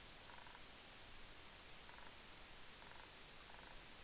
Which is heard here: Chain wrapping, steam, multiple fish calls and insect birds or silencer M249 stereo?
multiple fish calls and insect birds